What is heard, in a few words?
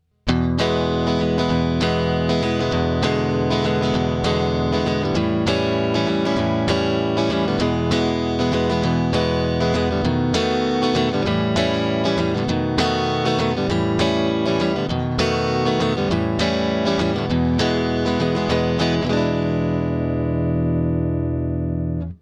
blues prog